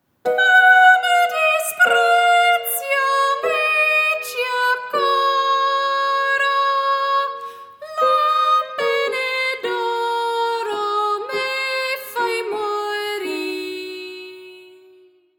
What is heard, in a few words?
Boy Soprano ITALIAN